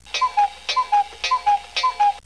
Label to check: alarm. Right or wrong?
Right